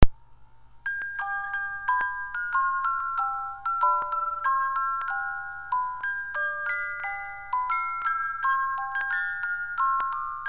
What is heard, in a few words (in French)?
boite a musique suisse; type presse papier